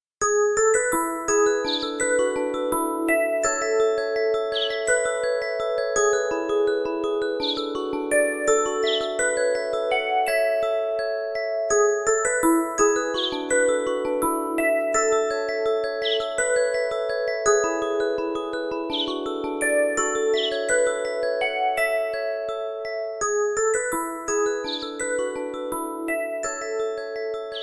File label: Musicbox; Reuge style